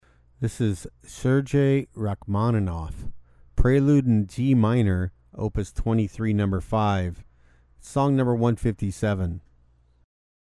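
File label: Prelude in G Minor, piano; Serge Rachmaninoff; Op23No5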